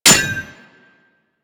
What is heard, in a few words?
Melee Axe Impact Generic; Warframe; Weapons; Axes